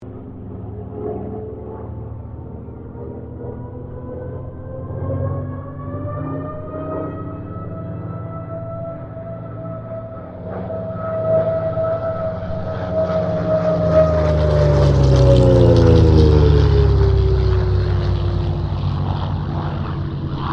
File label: Kittyhawk Boomerang pass, Airplane; Mixed aircraft squadrons; Airplanes (Sound Effects:Vehicles)